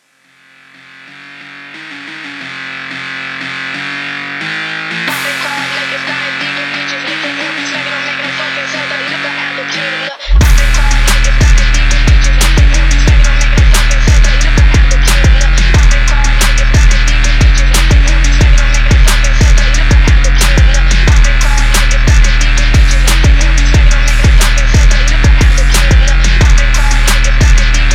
FONK

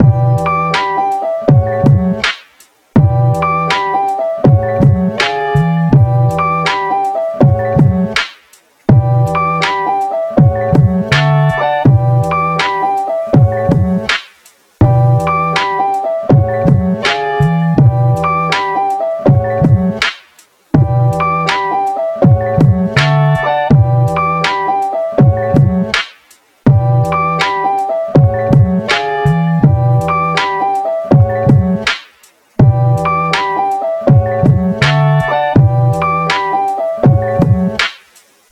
Ballad

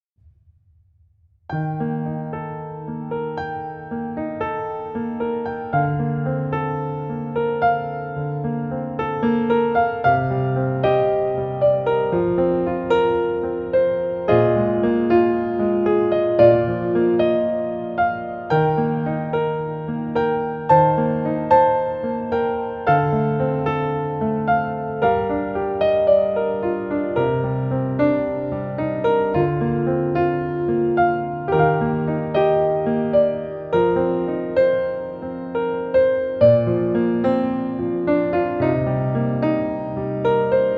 Piano

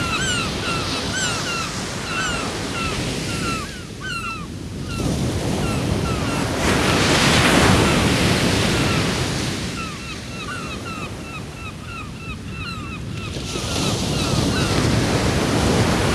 Rain Sounds for Sleep, Seagulls on

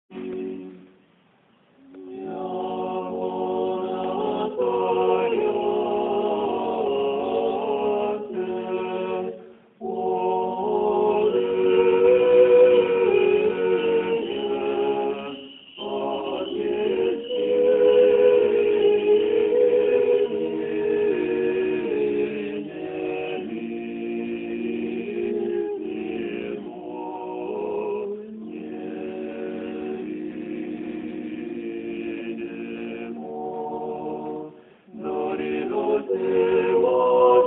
choir

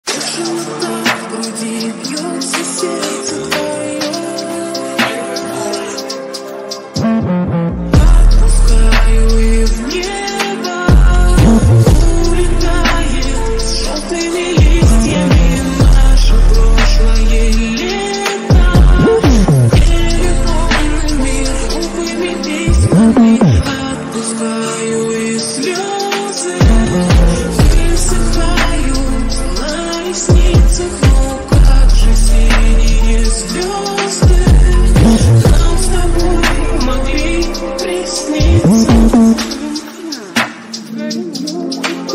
DRILL REMIX SLOW REVERB

